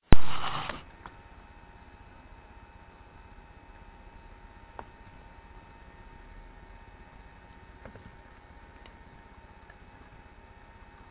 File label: inducing, male